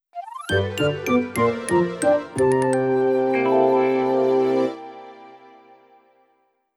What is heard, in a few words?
Glider Time's Up Jingle